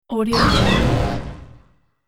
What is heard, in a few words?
robot space impact futuristic fx